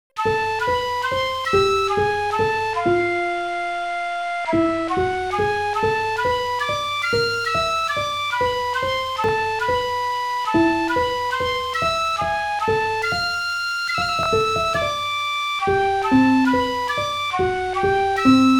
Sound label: flute